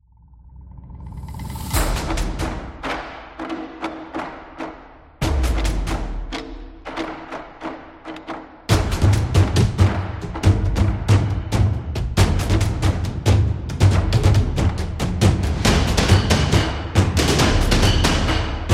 Japan Music

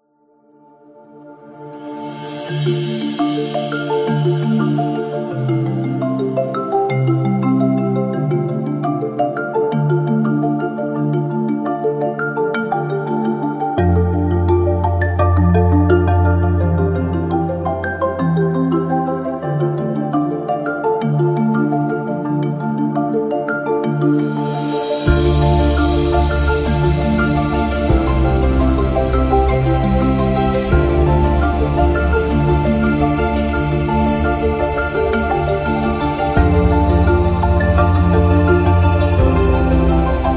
Background Documentary

